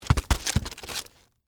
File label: Roll Cabbage Head, Texture, Roll, Cabbage, Head, Vegetable; Food; Household; BlastWave